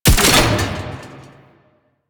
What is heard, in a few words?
Sniper Alt Fire Mech; DE; Warframe; Frumentarius; Exalted Sniper